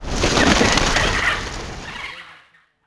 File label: BIRDS